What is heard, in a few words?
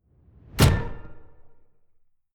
sci-fi damage zing horn high